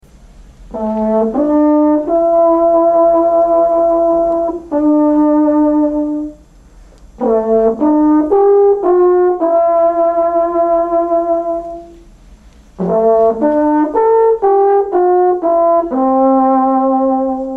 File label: rhapsody for euphonium; Vocis Via